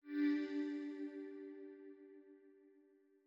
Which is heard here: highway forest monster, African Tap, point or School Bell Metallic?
point